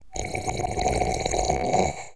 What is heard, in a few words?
GURGLE; homm2; 16BIT